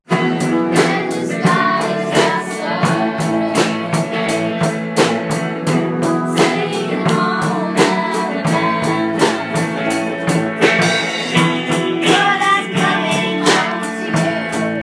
Rock Band

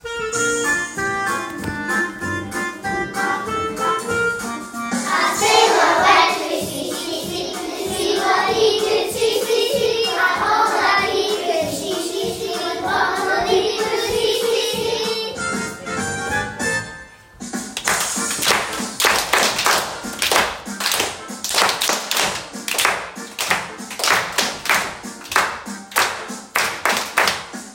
A Sailor Went to Sea sea sea

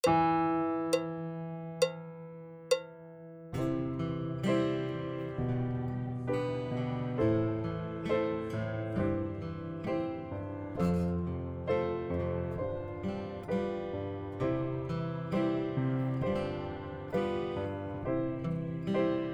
Backing; Section F

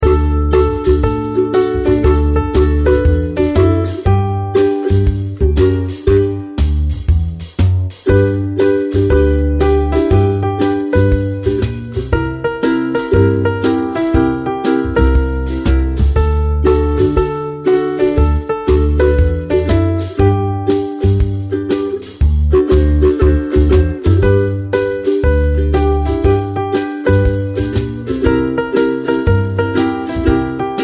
Teddy Bear Rock Backing Track No voice; youth music initiative

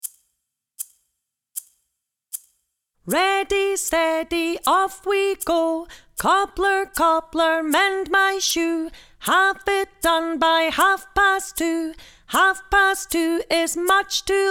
Cobbler Cobbler

